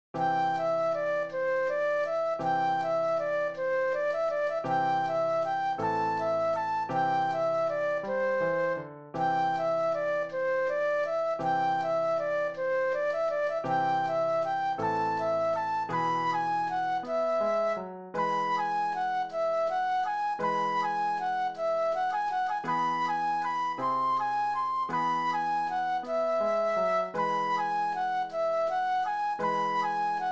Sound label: Flute Solo; classical